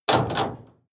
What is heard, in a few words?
lock metal door